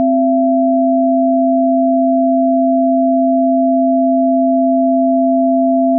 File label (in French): sine